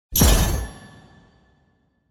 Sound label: Icy Magic Cast